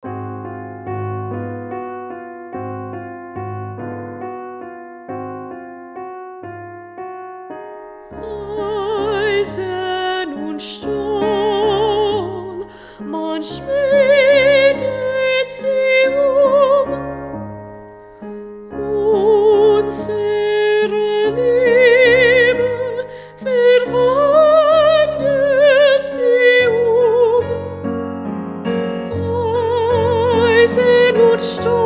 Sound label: soprano 2